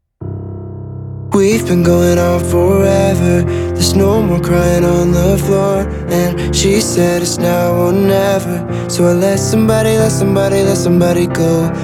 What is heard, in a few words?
full all delay off